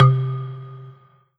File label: Roland D-20 Tropical Mallet C3